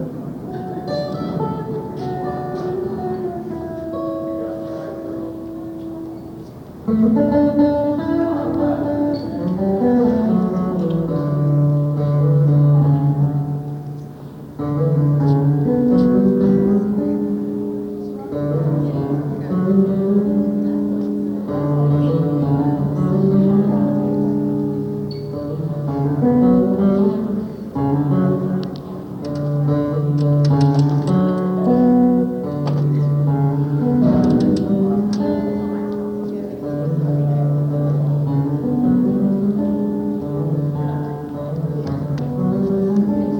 soundcheck

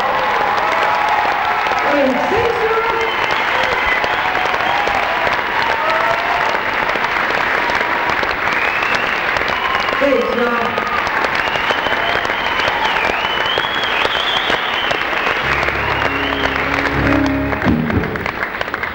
crowd

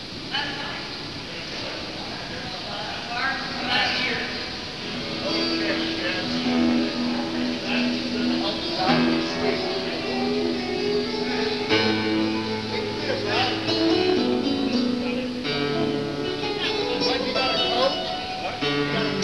three hits soundcheck

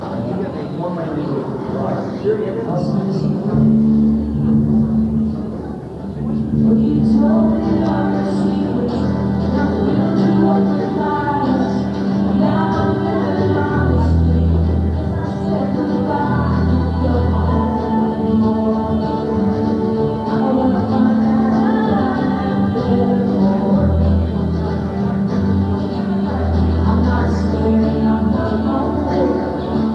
soundcheck

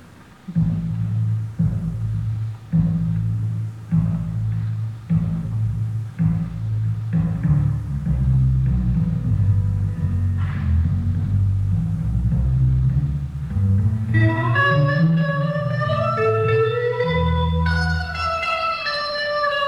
soundcheck

